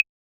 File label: Yamaha DJX 2B, T8 Clave